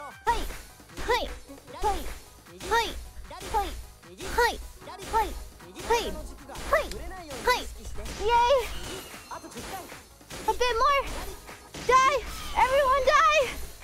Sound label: hoi hoi hoi and more again